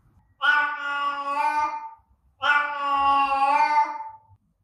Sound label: meow